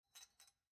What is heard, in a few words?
Foley Ponytail